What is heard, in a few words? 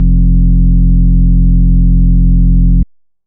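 bass sustain rollout F; Polyend; ARC Noise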